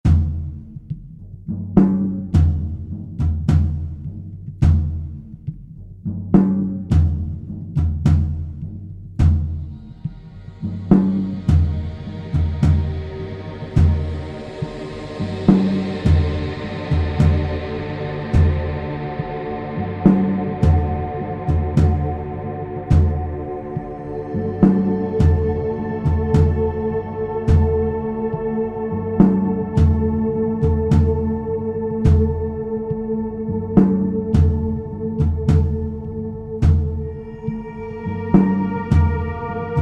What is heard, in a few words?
Music; Sol Forge